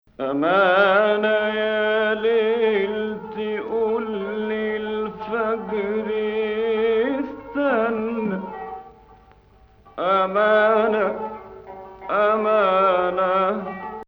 Hijaz Octave

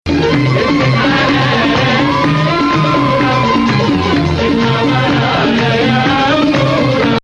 Nahawand

